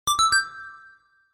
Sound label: 8 Bit Coin